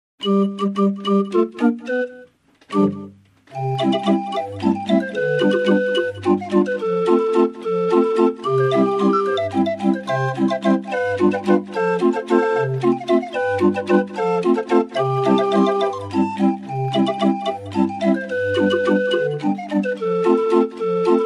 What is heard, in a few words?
Walsje, keramisch draaiorgel; jacobsladder